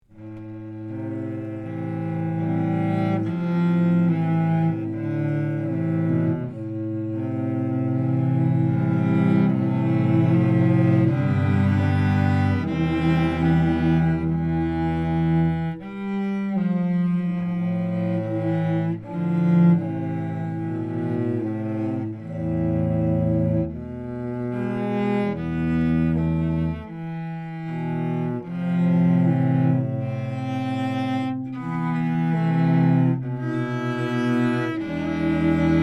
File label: Cello Choir Etude